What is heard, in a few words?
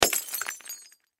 Impact Glass Break Smash Hit Shatter; Sound Effects:Materials; Blastwave